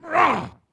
Wound; Captain Rupert

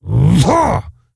Stonebark Attack; Elder Stonebark

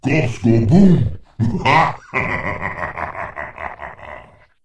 Explode; Trollgore

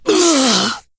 Wound; Ionar

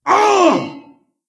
Wound Crit; Stormcaller Brundir

